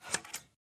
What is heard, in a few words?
Boss Scissors; Winkydink